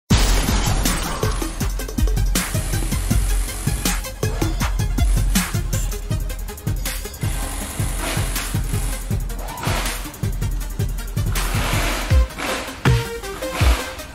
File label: Indescribable Beat